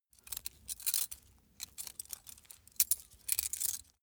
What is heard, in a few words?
small metal handling scrunch